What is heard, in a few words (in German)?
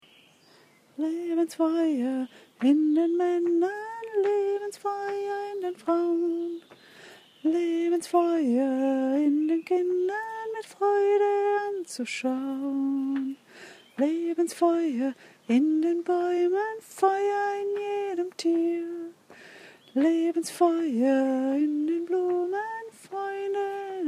feuer lebens lied hauptstimme